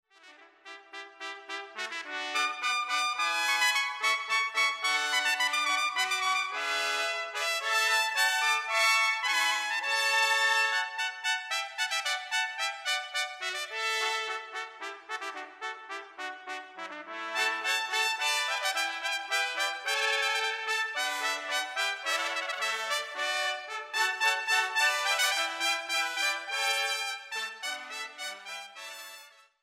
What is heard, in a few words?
Hornpipe